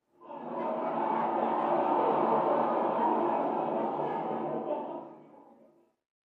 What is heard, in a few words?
Laughing Small Crow; laughs medium